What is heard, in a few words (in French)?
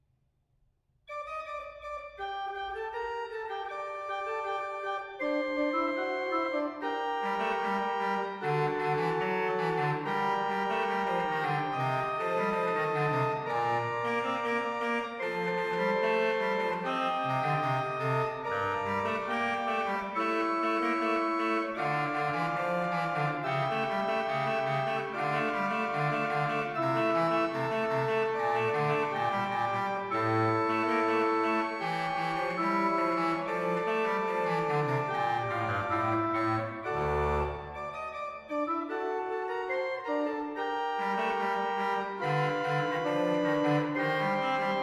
Basse De Crormorne, organist